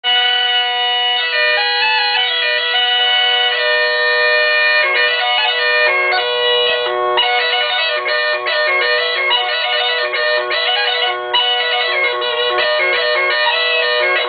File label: bagpipes pohronsky inovec